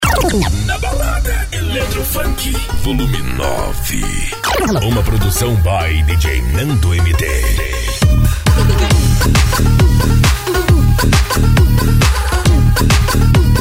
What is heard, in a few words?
electro funk; na balada